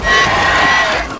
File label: pain